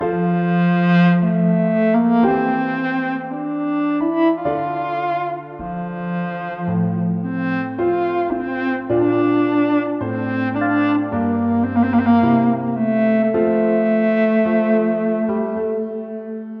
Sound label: cello test; marcello